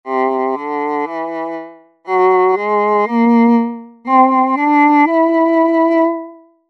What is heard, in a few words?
viola, synteza